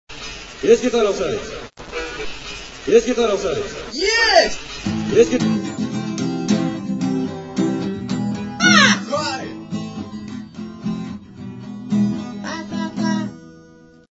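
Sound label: guitar in the hall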